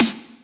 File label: focused steel snare drum sound a sharp key